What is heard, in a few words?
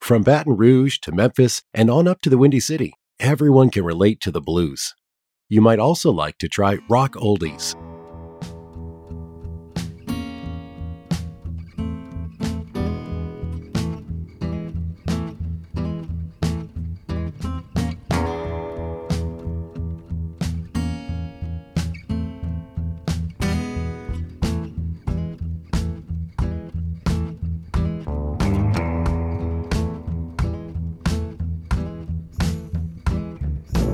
Blues, music on hold